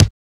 RX KICK

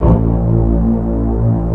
CMI bow; Greytsounds

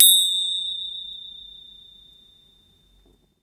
PENGLING; INIS - Opium; PERCS-KIT

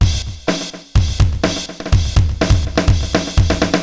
80s delay Akai XE8 (125BPM), cyborg beats; musicradar

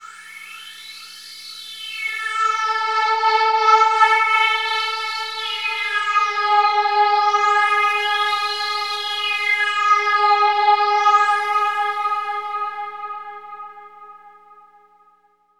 PAD, Drum & Bass

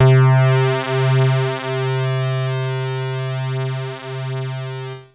303-Style single hits

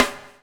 BUBL, Backbeat; Spectrasonics - Backbeat

